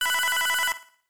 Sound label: Phone; Hotline Miami